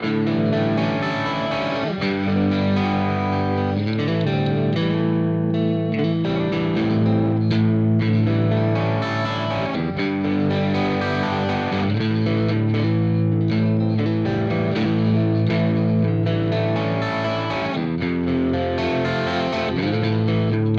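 Hagstrom Ultra Max Special Bridge Mid Split, guitar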